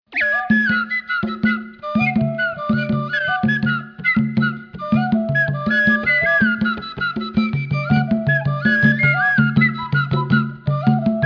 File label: flutes, hoquetting; african